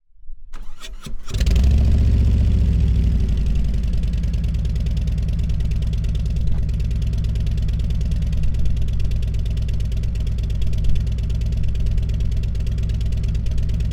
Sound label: Touring 107 Slip-On Idle